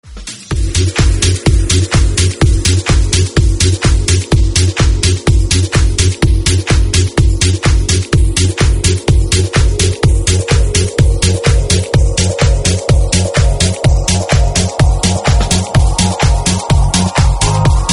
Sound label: Jackin House Dub